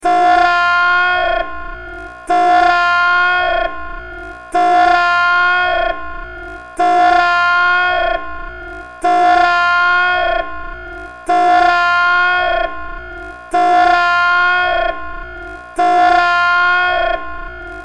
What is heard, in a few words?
Annoying